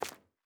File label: Footstep Concrete Walking